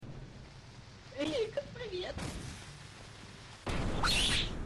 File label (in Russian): zhestkii krik